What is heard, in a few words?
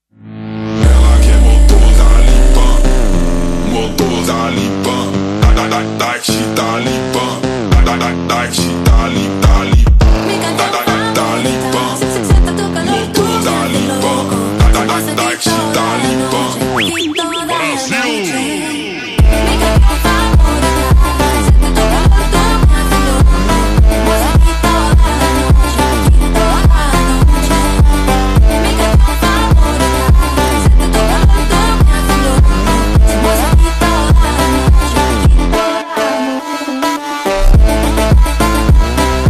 BAILE VIBE Slowed